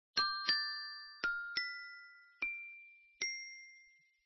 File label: Respiration